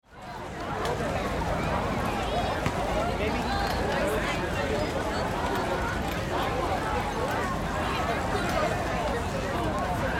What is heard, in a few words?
Ambience High School Crowd Outdoor City Urban